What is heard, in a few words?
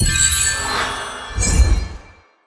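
Mana Change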